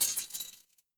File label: glass shatter